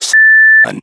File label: Beep; speech; quick commands; World Of Warships